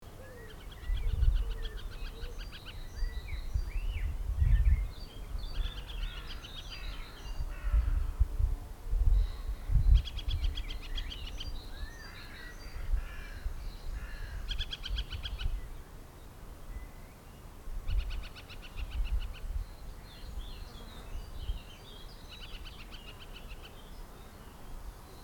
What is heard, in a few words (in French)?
femelle; bruno durand